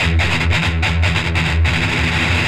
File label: Riff, Industrial, Nasty